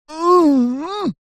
human male middle aged rude are you thick type grunt